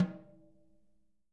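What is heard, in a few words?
jazz snares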